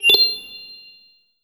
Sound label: bonus earned in video game; mixkit